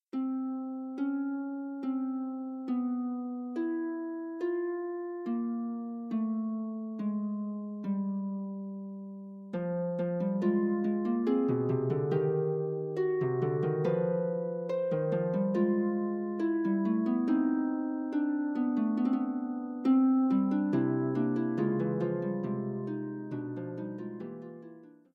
Fugue, Harp; Forty Eight Preludes and Fugues Book 1

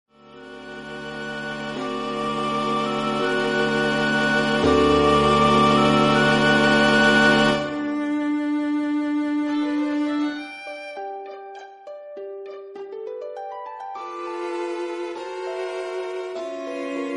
Quintet; Movement 1

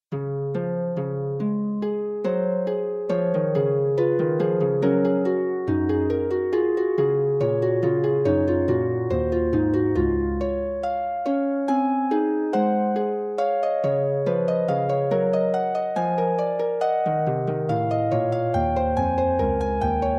Fugue, harp; Forty Eight Preludes and Fugues Book 2

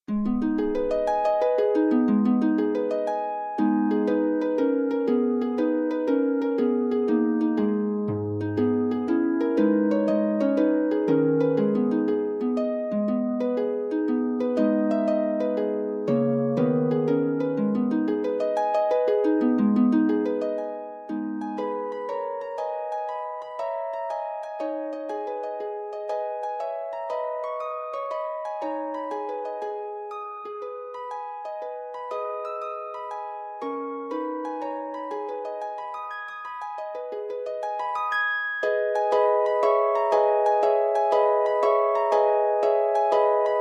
Three Welsh Jigs, harp

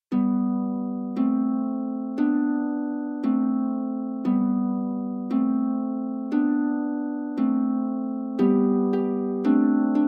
Harp The Mewing Cat; Tudfuls Harp; Pwsi Meri Mew

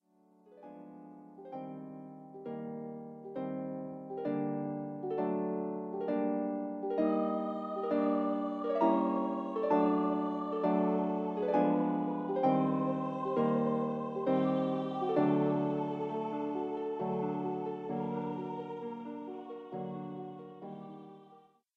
Voice and Harp; an den mond

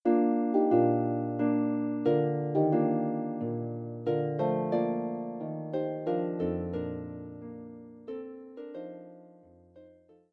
Harp

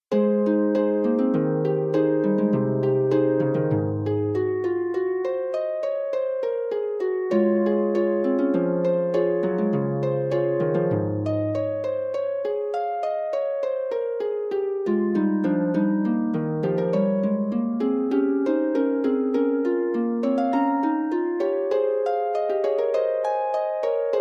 Prelude, harp

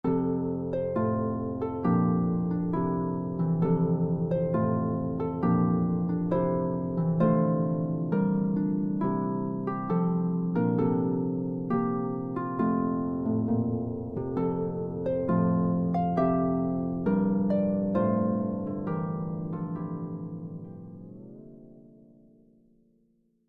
Harp

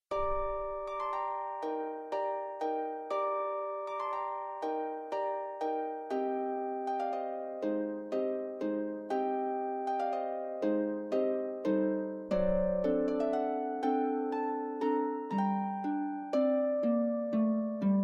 Harp Music from Argentina, Serenade Andalousienne

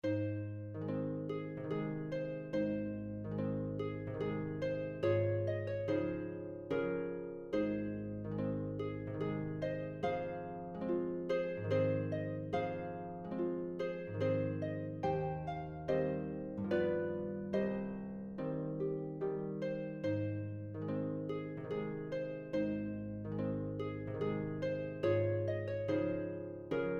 Waltz, harp; Brahms

